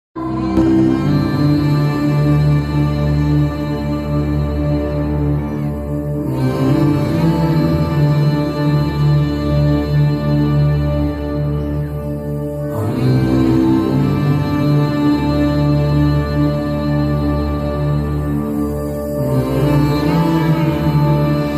death bgm emotional bgm ringtone; acharya; ramchran

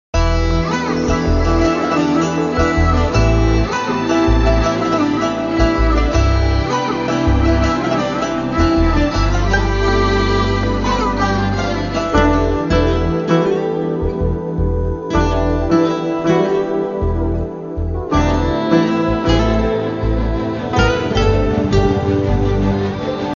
romantic love bgm ringtone

